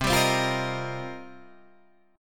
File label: C Minor 11th C down; strummed; JGuitar